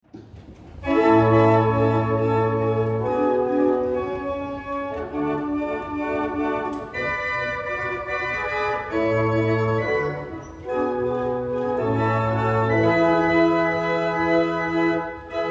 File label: Double chant, barrel organs; Norton